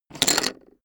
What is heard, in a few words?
poker chip stack small fall down hard